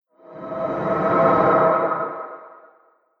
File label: Wicked Wind Whoosh; Wind Sound Effects